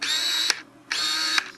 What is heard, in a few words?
pentax 50-200 f4-5.6 focus